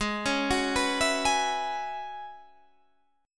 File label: F sharp 7th Suspended 2nd Suspended 4th, G sharp